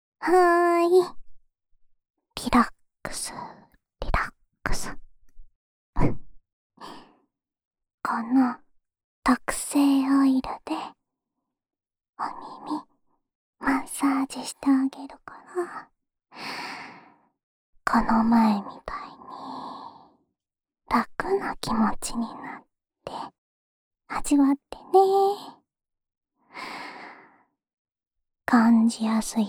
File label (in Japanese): 安眠用オイルマッサージ（右耳）, ASMR